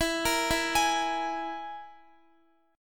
E-Major Flat 5th E, Guitar; EMb5 arpeggiated

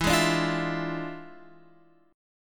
E 7th Flat 9th Guitar Open F, chord; E7b9; strummed; x,11,8,9,8,11

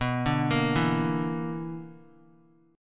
B Minor Major 7th Double Flat 5th B, Guitar; arpeggiated; guitar chord

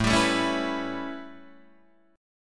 A 9th A, Guitar Open D

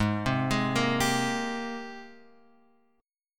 Ab Major 9th, down, Guitar, Open F; AbM9 arpeggiated; JGuitar

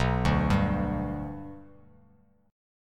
B Minor Sharp 5th, Bm#5 chord; arpeggiated; JGuitar

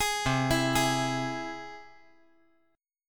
C Augmented down

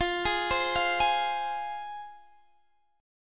F Minor, Guitar, Open D

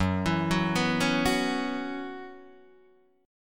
F sharp 7th Suspended 4th, Guitar, DADGAD

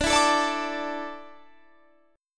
D Suspended 2nd, Guitar, Standard; Dsus2; strummed; 22,19,19,19,22,22; down; capo=9

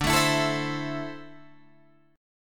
D 7th Suspended 2nd, Guitar, Drop D

